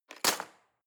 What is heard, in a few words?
knives wood drawer place hard